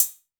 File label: natural hat sample a sharp key